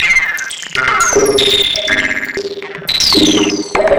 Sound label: Jitter Bug, Synth SFX; Sonic Foundry (Sony Creative Software); The Electronic Music Manuscript Collection